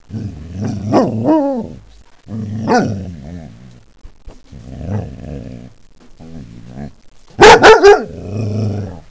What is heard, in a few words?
growl